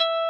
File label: N.H.